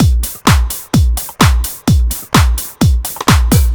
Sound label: Drums, 128bpm, Nu Dance Electro; Zero-G; Soundclash; Combi Loops; Monofonic